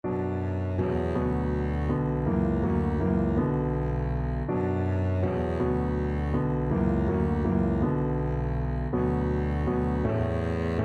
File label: we three kings, Double Bass